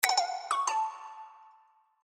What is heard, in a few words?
Notification; Myntra